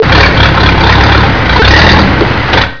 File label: TRUCK; psion cd vol1